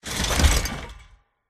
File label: movement foley; silverback